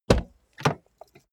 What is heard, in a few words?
car bmw e46 exterior door front open; Cars